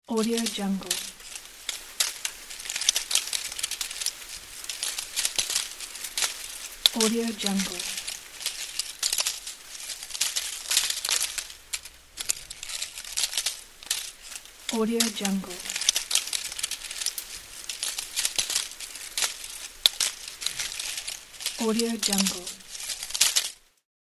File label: leaves falling